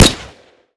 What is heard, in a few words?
p90 silencer; Modern Warfare